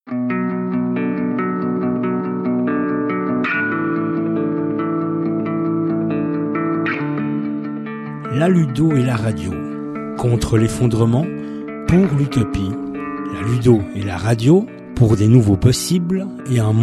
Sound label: Jingle